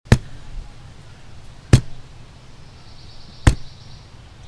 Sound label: Kick